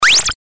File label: jump